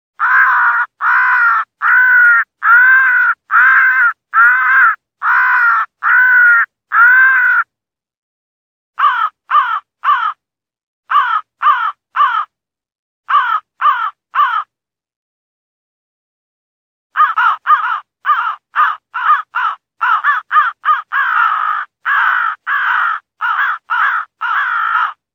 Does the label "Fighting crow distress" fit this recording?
Yes